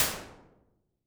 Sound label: impulse response